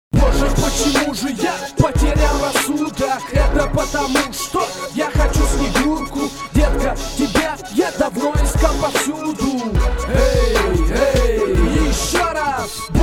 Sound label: micro streetbeat; AI Generated